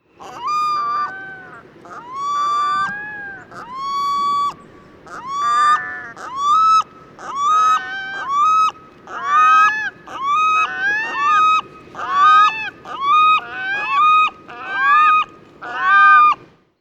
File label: wail duet, Stinson Beach CA